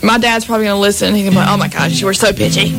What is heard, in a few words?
so pitchy